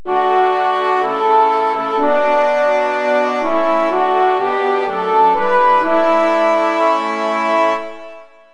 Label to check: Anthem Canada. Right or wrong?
Right